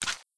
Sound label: glock inspect, weapons; czero opforce